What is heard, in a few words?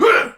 Attack Effort; Warframe; Tau; Dax; Ashigaru